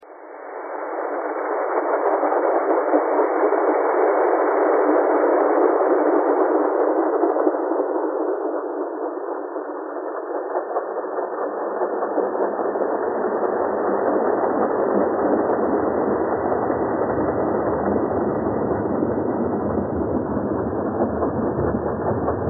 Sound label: train thread all